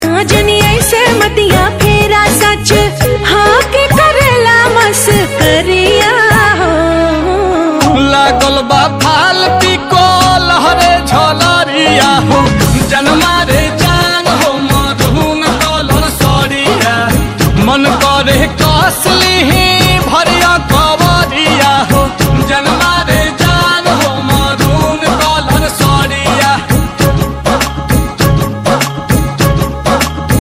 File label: new song